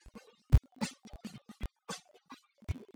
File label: Latino